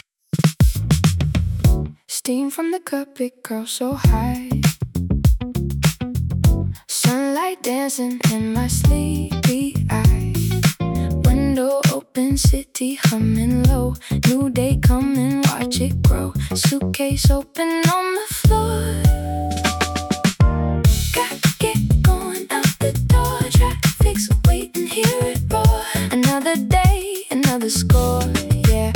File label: Morning Commute; 충청